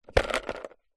sport ping pong balls in plastic tub set down; zapsplat

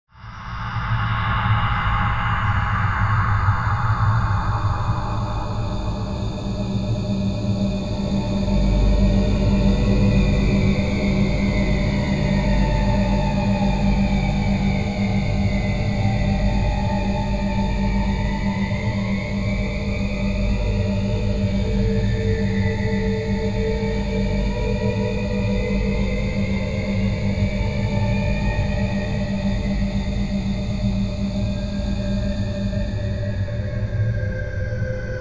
sound design horror drone atmosphere

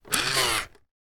science fiction robot movement single; sound effects one

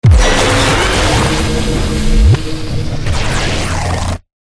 EXTREME IMPACT; PMSFX

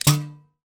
industrial spring twang mechanical

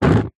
nature fire flame air wind blow blast fast short

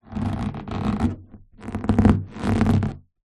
balloon inflated rub creak squeak; foley; zapsplat

